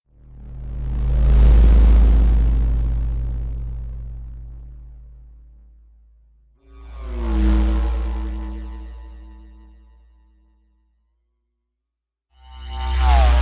Futuristic vehicle transitions.; Futuristic Vehicle Passes; Spacecrafts (Sound Effects:Science Fiction)

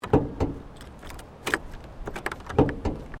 Car Ford Focus Bonnet Key Remove

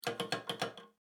Household Toilet Handle Shake, bathroom; Bathroom Sound Effects; Bathroom (Sound Effects:Household); SFX Bible 6

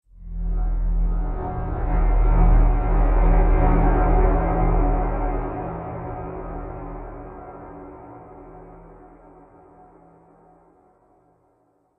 Ominous Ufo Groaning Motion, spacecraft; Andrew Fleming

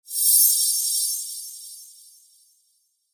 Pixie Dust Sparkle Shimmer Swirl; Blastwave

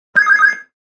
Video Game Sound, Video game sound; Part of Video Games (Sound Effects:Games)

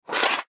Coin Pick Up Single Pirate Gold; Money; Foley; Blastwave

